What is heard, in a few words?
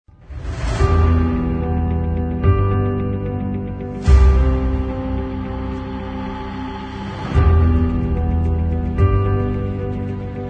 Epic Trailer; An Epic Trailer